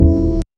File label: snapping